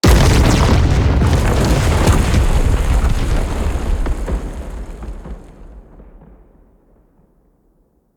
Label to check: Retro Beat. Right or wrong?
Wrong